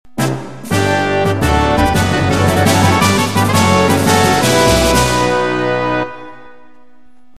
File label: fanfare